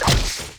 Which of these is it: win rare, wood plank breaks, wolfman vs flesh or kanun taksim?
wolfman vs flesh